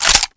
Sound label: mp40 bolt back